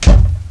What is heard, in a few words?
Thump; Princess and the Pea; SoftKey